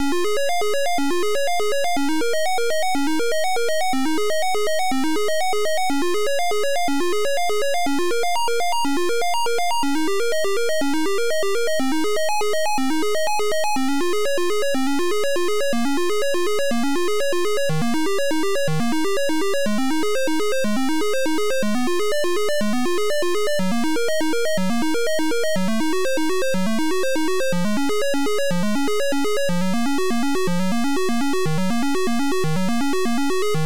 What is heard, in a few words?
MELODY